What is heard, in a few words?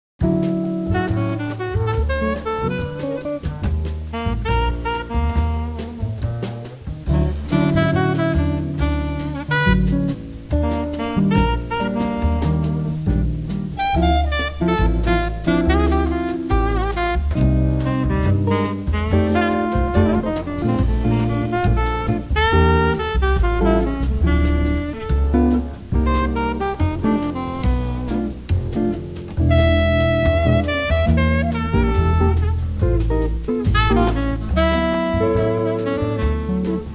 On Hold Jazz